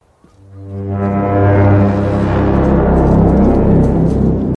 serpent horn

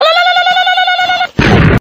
lalala boom

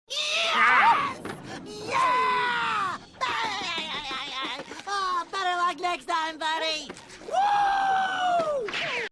yeeaaahhhhhh

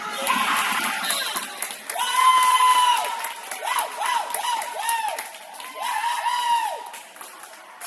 yeahhhhhhhhhhhh

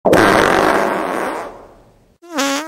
big ripper fart

